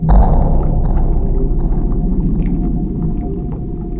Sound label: weird effects